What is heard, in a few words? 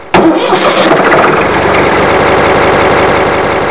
Truck Start; psion cd vol1